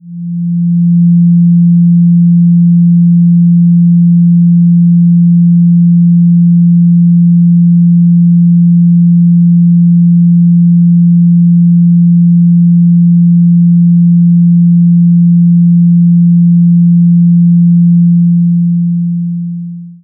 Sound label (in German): Planetenton Platonisches Jahr